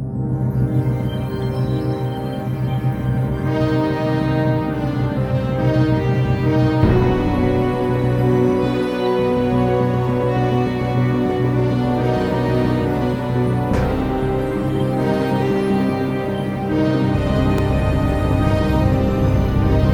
danger horror; theodyssey

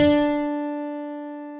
lyra; theodyssey

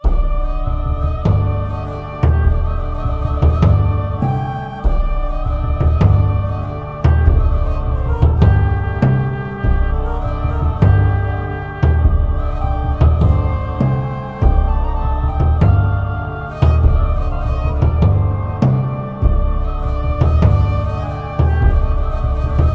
danger voices, music; theodyssey

